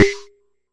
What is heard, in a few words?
Jomox MBrane, drum machines; Jomox MBrane 11